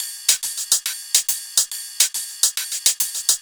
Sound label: Shuffled Loops, 140 BPM